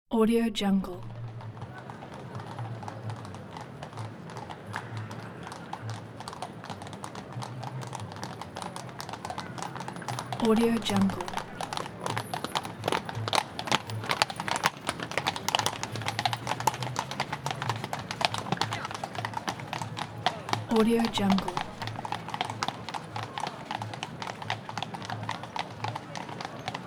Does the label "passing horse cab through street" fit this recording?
Yes